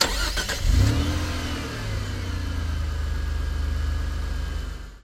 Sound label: car start